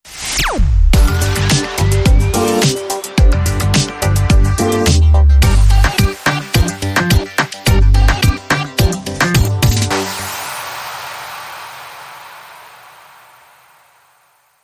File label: Vox Radio